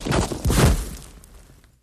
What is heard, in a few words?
Horse Hoof Hvy